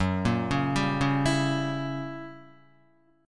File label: Gb 7th Flat 9th Gb Guitar; arpeggiated; JGuitar